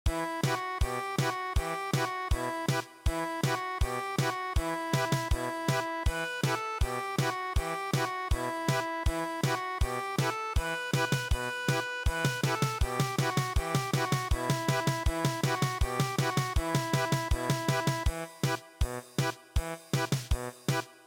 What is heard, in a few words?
canon German Polka; traditional